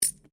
COIN PENNY SINGLE DROP HAND; Common coins; Money; Objects

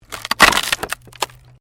WOOD PLANK SPLIT

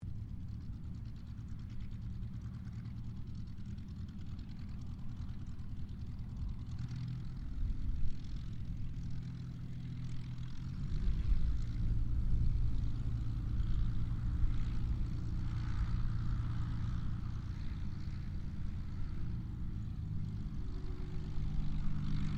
AIRCRAFT P-40 KITTYHAWK TAXI DISTANT STEREO; P-40 Kittyhawk / Warhawk / Tomahawk; Airplanes

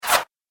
Arcane Magic Missile Throw; Magic Sound Effects

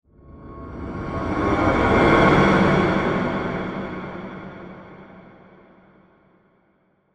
Scary String Transition With Reverb; Scary Soundscapes; CraftedSignals

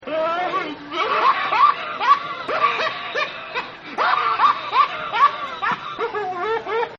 Big Laugh; Laughter; Voice

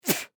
Human Male Spit; Spitting

